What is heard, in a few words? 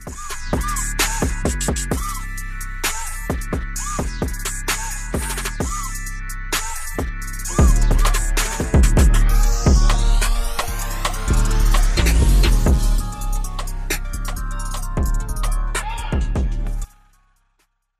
nokia remix song ringtone